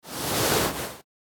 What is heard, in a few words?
Sleeping bag dragging noise